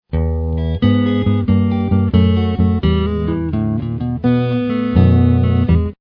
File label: blues guitar